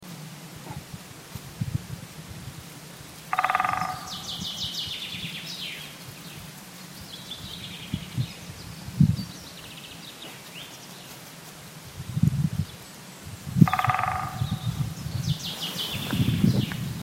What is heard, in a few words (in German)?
specht